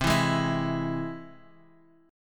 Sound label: C Augmented down